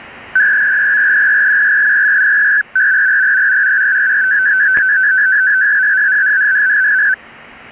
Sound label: 250 Bd ALE, Siemens CHP-200; SIEMENS CHP-200 system during selcall-procedure; SIEMENS CHP-200 FSK-SYSTEM; FSK-systems